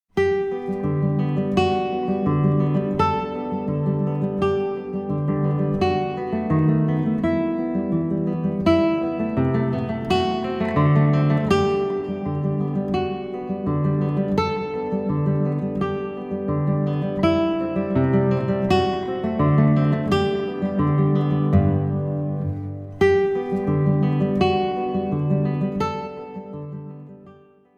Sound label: Con moto, guitar; Play Guitar Powersteps 1